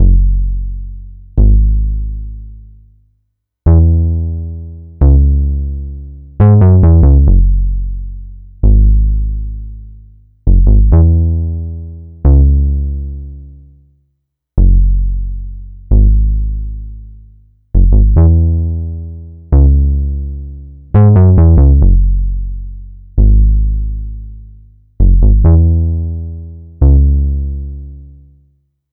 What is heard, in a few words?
Synth loop 66 C#m, Synth Bass Loops; Slate Digital BASSHOLE Sample Pack